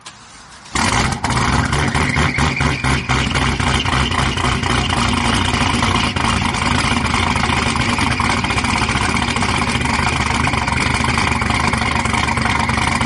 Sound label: turbo boost